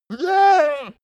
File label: goat baa